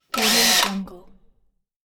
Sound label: cordless drill short